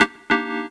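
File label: KEY1 Right, FUNK; USB Soundscan vol.47; AKAI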